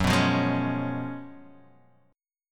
Gb Major 7th Suspended 2nd Suspended 4th, Guitar Open D; chordshape; down